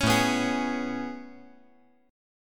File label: A Major 9th A